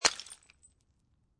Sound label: Glass Bottle With Liquid Break, Impact, Glass Beer Bottle, Liquid Inside Bottle, Break On Cement